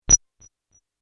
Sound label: Telemetry Single, Scifi, Telemetry, Single, Blip, Science, Fiction; Communication